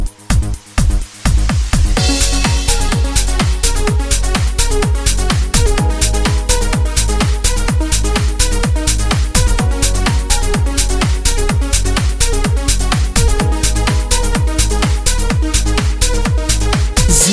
Zindgi Hip Hop

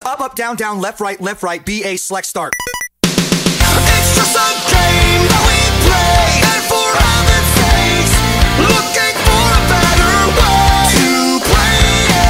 Game Over

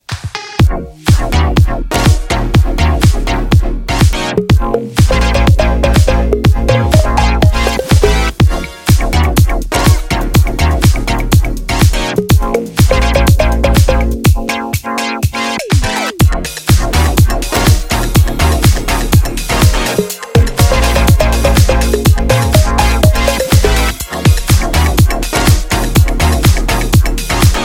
Funky Synth Ring

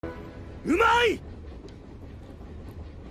Funny hehe

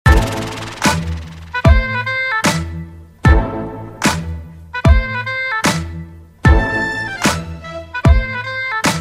Sonata In La Minor

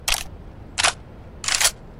Ak-47 Reload; CSGO

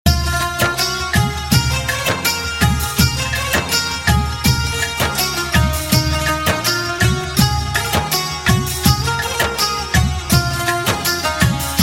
Indian Tune

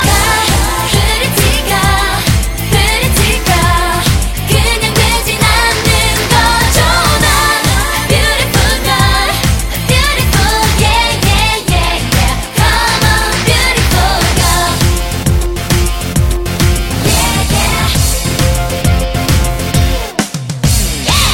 chorus

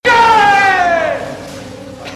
Yay

